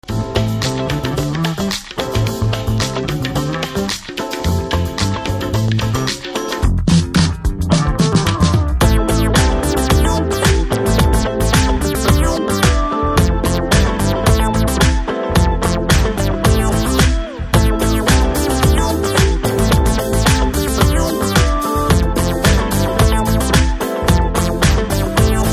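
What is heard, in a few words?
background music Ringtone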